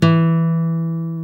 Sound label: GUI NOTE E, Acoustic, Roland